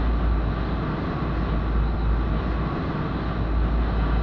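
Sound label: Engine Thrust Interior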